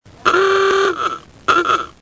long arrow ahooga horn

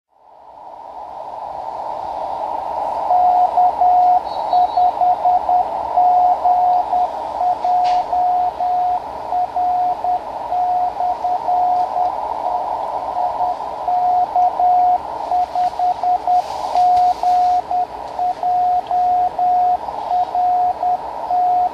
beacon, 10m; 28.224.3; Corpus Christi; K5GJR

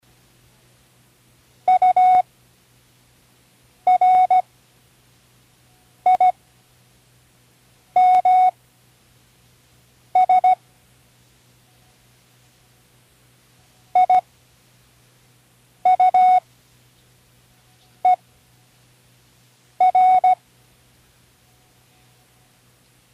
random, Learning Morse Code; Tune in the World

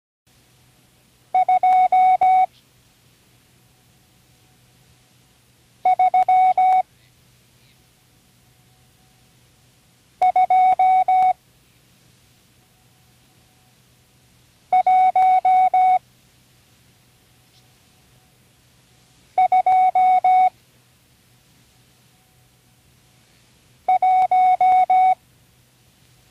random numbers 1-5, Morse Code; Tune in the World

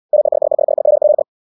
Mississippi - 100wpm, cw

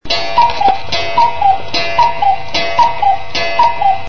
CUCKOO CLOCK

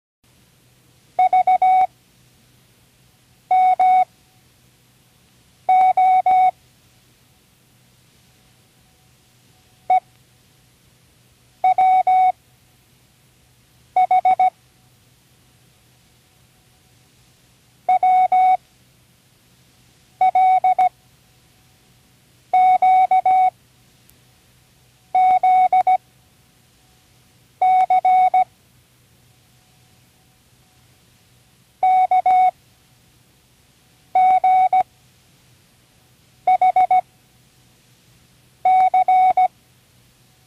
random all letters, Learning Morse Code; Tune in the World

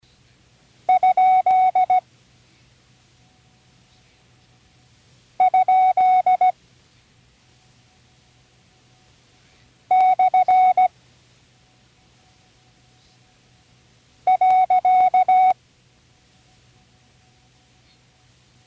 punctuation review, Learning Morse Code; Tune in the World

